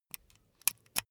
insert usb cable quick

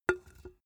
empty can put down hard

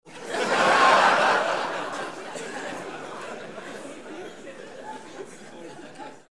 laugh long

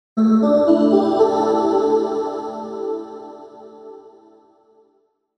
breathy bells

